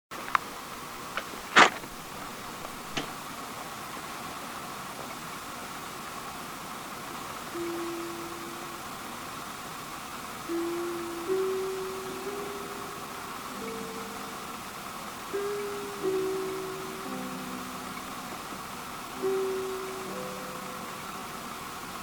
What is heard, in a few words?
no noisegate